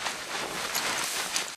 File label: wounded drag; Titanfall 2